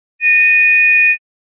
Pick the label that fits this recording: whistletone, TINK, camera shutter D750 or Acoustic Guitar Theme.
whistletone